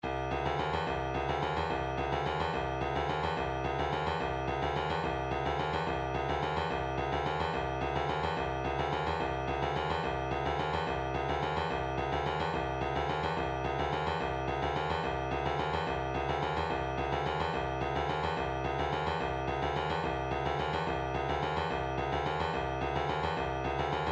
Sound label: vocal planet 4 4 72 bpm, midi; Big Chick